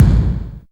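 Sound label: bass drum, Rave Kick Snare, Percussion; Dance Synths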